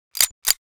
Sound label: Firearm 9mm Rack; Firearms; Zomboid